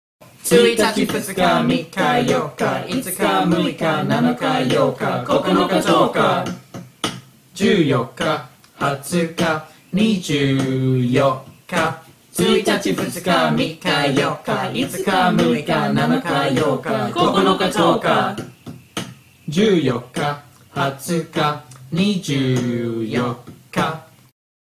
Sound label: days of month japanese rap